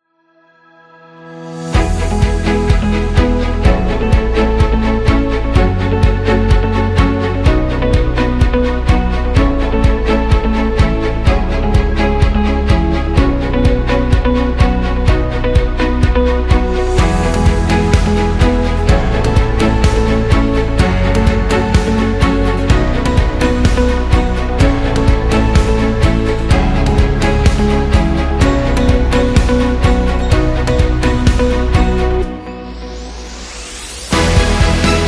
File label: Motivation Corporate